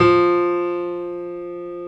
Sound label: F2 PNO.FOR, G.PIANO; AKAI S-Series CD-ROM Sound Library VOL-7